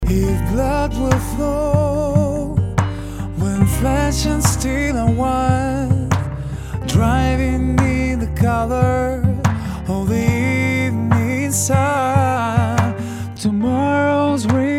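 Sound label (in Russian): До тюнинга вокала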